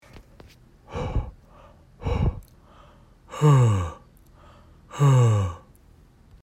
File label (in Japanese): 深いため息